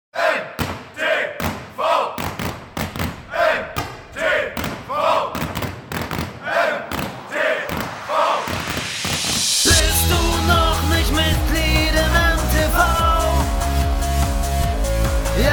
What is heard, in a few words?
JINGLE